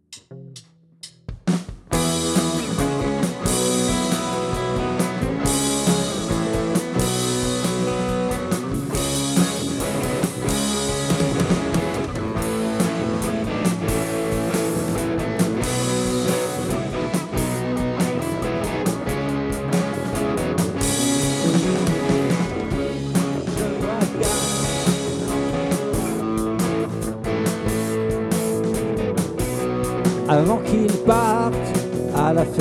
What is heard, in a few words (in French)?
train corail